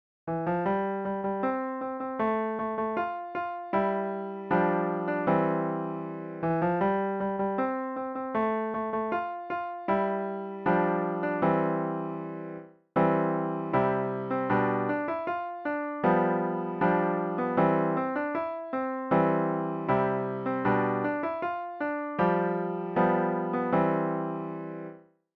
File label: piano